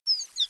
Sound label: flat down sweeps audible